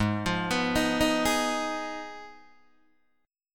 Ab Minor 6th, Ab, Guitar, Open D

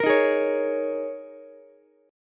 G sharp Suspended 2nd Suspended 4th, Ukulele; strummed; 15,15,16,16

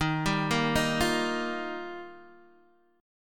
D sharp Major Add 9th, Guitar, DADGAD

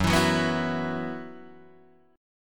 F sharp 7th Suspended 4th, Guitar, DADGAD

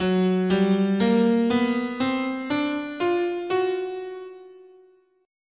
Gb Persian, scale

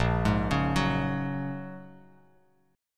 Bb Minor 7th Sharp 5th, Bass Standard; arpeggiated; JGuitar

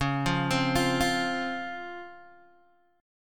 Db Major 7th Db down Guitar DADGAD

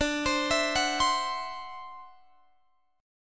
D 9th D down Guitar Open G

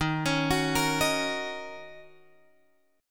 Eb Minor 7th Eb Guitar Open F; Ebm7 arpeggiated

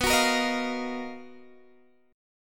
B Major Sharp 11th, B, down, Guitar Open G

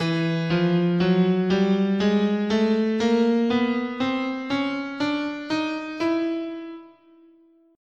E Chromatic, guitar, scale

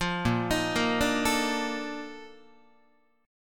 Bb Major 11th Guitar, chord; arpeggiated; JGuitar

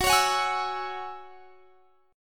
F sharp 7th Suspended 2nd F sharp down; F#7sus2 strummed

